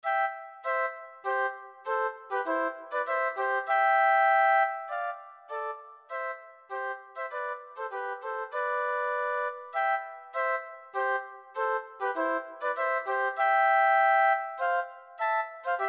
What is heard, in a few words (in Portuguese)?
flautitando